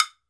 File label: TOKE BEL, Drums&Percussion, Toke Bell; E-MU Producer Series Vol. 7 – Old World Instruments